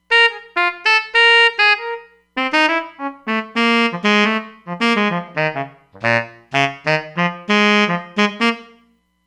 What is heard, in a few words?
Tenor Sax, Roland Fantom XR